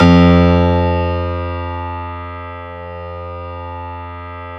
Stereo Grand, Keyboards; E-MU Producer Series Vol. 2 – More Studio Essentials

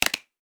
clap, hip-hop drum kit

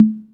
E Tom; PLUTO KIT

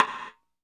GATED, x-sticks; Sampleheads; New York City Drumworks VOL-1; KD X-STICKS

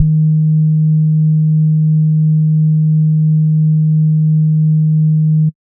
SBASS D#2, Sub Bass Sine; neuro; Stanza

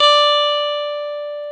GTR, Clean; Club-50 - Foundations Roland

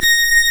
Synclav FM STRINGS A#; Big Fish Audio

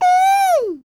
MMM, Vocal Blues Soul; Spectrasonics; Planet

